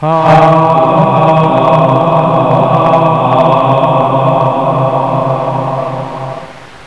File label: HAHA